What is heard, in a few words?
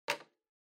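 Portable Stereo Remote Control Put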